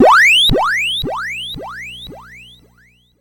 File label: C64 FX Space Invader